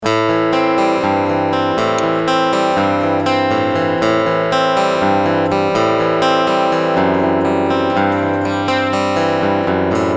EQ Mitte CoolTube AUS

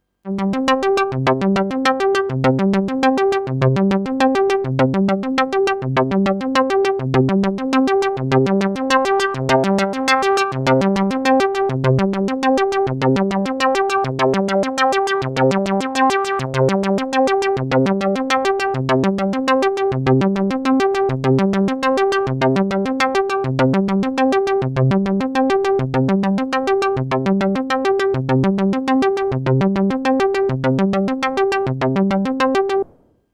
Monopoly Sequenz

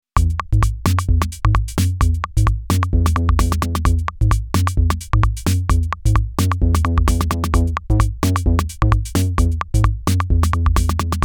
Akai Rhythm Wolf diverse Beats mit Bass

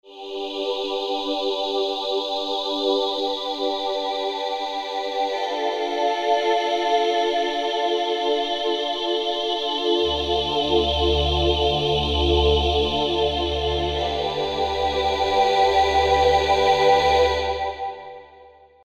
Cybervox PolyMorph langsam

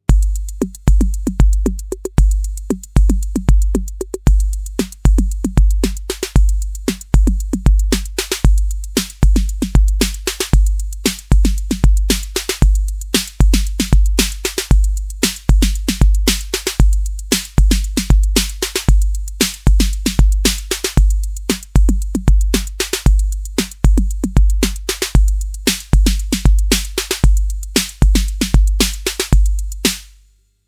drumatix snare 1 und 2

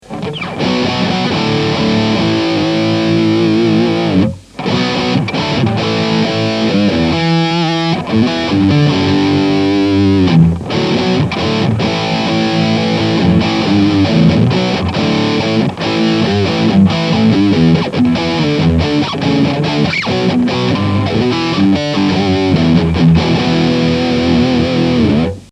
Blackstar max Gain ifs amerik.

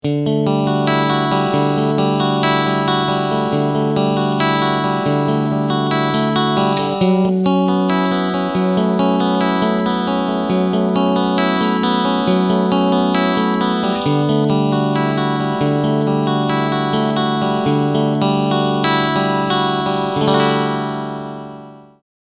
Middle Steg Clean Picking

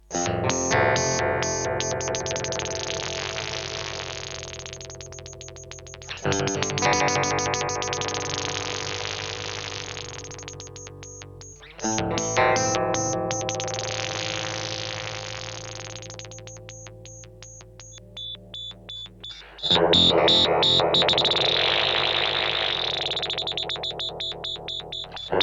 Radius Freq Shifter mit LFO; Red Panda

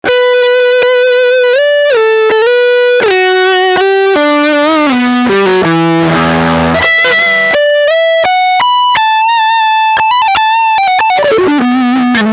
Leadline Frontpickup

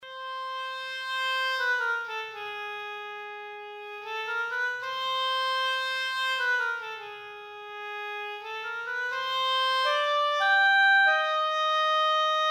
woodwind oboe solo hoch

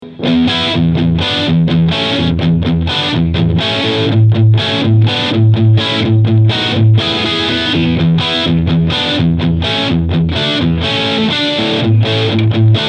Riff Sound Humbucker Full Gain EQ 12 Uhr; Behringer HA 40R

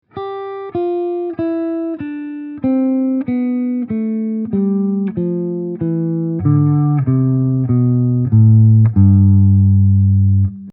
mixolydisch ab

